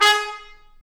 BRS TP, Roland, Stabs; Roland L-CDX-03

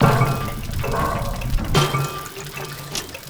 CLANK; ENVIRN-AMB

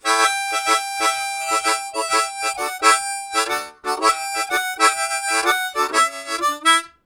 Vamp, Harmonica Riffs; Zero G

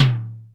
XE8 TOM; Crypton - DrumBase5000

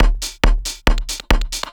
drum 138, Techno; Techno ID; PS-45

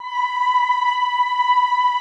AHH B4, Classical Choir; Best Service; Extended Classical Choir

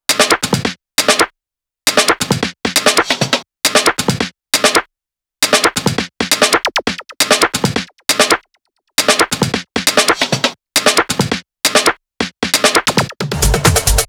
chopped break 35bpm

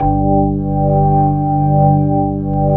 ORG, Organs

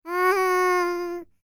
PERSIAN, World Voices; ILIO; Vocal Planet VOL-5

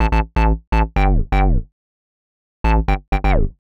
Hard Synth Bass A, 125; PowerFX - Nu Jazz House

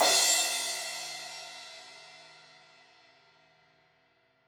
Crash 16in edge, Cymbals; Deeply Sampled Drums

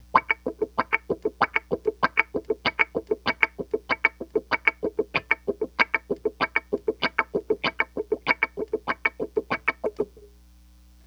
WAH GTR, Funk Gtr & Wah; PlatinumPhattCD2; E-MU Producer Series Vol. 8 – Platinum Phatt

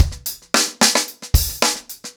Hip Hop 110BPM, acoustic drums drumloops

